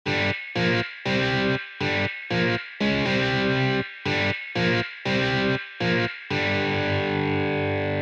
Hard Riff

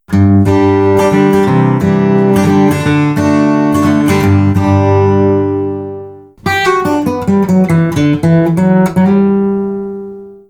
Tortex Picks; Torteks

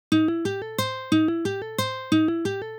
Hammer on Hammer On

